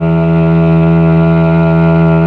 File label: MEL CEL D E 3, mello cello